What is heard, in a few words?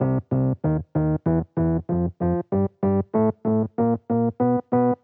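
Jazz Keys Electric Piano 95 bpm Riff C; B-line n Riff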